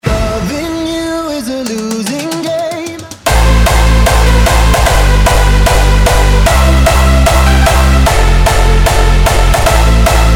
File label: Arcade BEAT